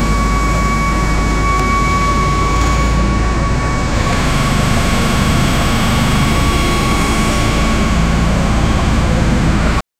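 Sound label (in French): bruits de chantier de construction, activity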